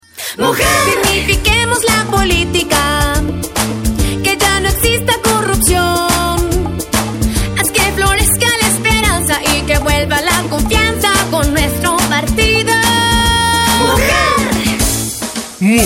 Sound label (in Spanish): Jingle; Partido Mujer 30 DOS